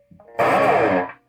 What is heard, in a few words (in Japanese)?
グリッサンド, guitar